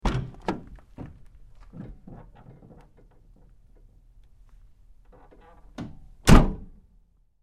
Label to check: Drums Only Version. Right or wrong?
Wrong